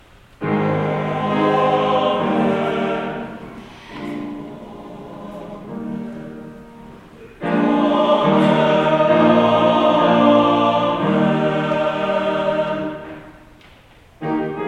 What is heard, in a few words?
Choral